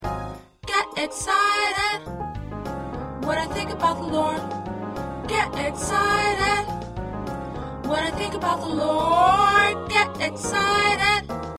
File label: Alto